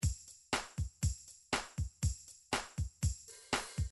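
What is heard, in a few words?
midi drums country rock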